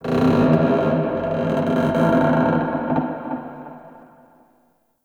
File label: Wood Creak; Ambience; Warframe; Shadowgrapher